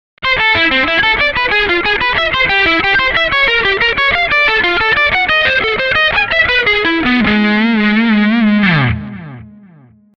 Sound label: sweep arp